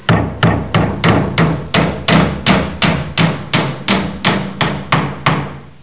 HAMMER

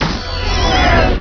BEAMIN

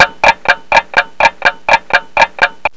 TICKTACK

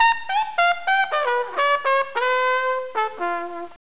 Cool Trumpet; psion cd vol1

